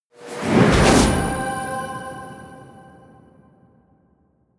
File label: promo pad; whooshes rises